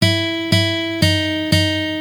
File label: flat example, guitar